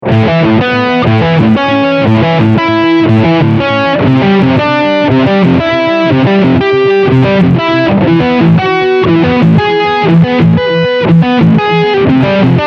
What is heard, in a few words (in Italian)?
accordi nona, guitar